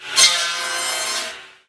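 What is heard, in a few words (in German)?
Kreissäge Sound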